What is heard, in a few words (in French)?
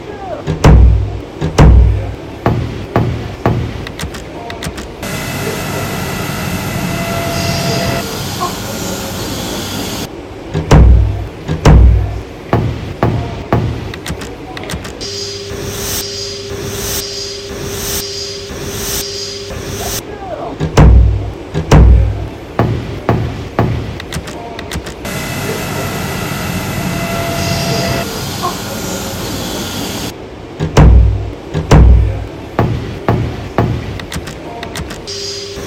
Musique; le departement gmp compose par les etudiants